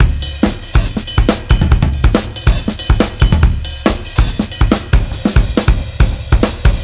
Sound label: Bon Bon Bizzle, Breakbeats, Drum n Bass; KJ Sawka; Drum n Bass & Beyond